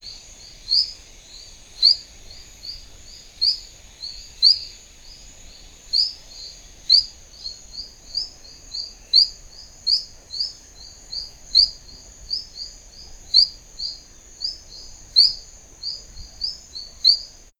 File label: Hyloxalus cevallosi